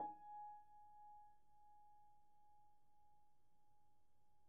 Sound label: piano pp, steinway